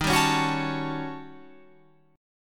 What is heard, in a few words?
Eb 7th Sharp 9th Flat 5th, Eb, Guitar, Open F; Eb7#9b5 strummed; JGuitar